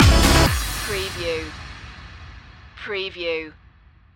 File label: electronic progressions stinger